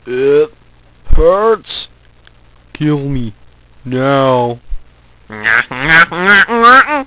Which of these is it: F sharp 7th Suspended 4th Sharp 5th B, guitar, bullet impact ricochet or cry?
cry